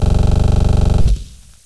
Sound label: war, war zone